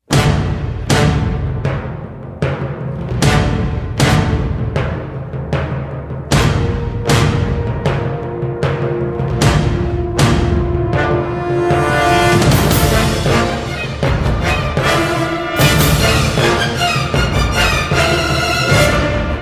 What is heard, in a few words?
Without Choir